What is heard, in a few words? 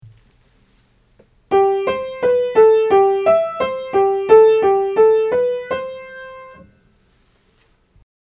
Major Scales; Aural Training exercise